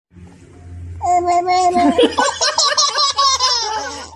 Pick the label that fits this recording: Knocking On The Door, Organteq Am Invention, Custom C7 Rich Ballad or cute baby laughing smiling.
cute baby laughing smiling